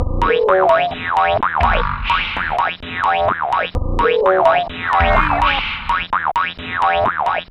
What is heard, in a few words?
Industrial; Sonic Foundry (Sony Creative Software); Underground UK House & Electro